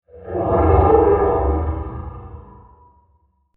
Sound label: Diffuse Horror Transition Creepy; Scary Soundscape; Crafted Signals